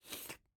Slurp

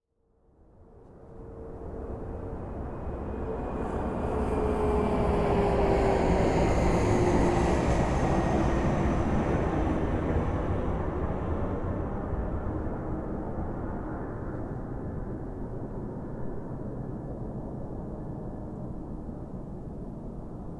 Jet

